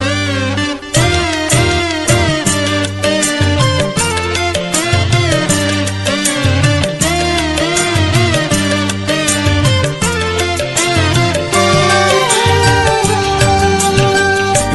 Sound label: indonesian dangdut ringtone